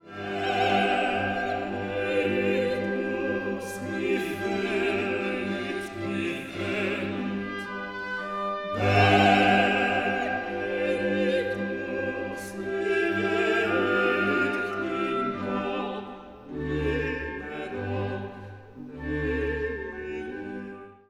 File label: Benedictus Loud soft